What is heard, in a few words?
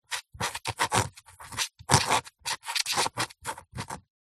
office quill pen writing slowly